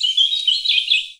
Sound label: Birdsong Bit, Oxford Island Lough Neagh